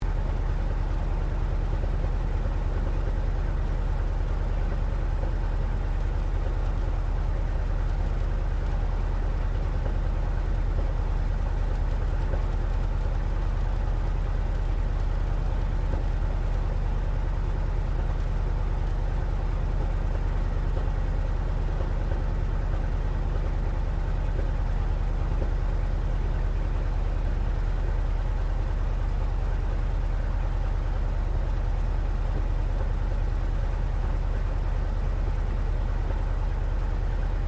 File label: AIRCRAFT T-28D TROJAN IDLE INTERIOR; Airplanes; Vehicles